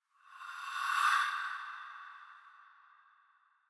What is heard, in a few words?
Scary Voice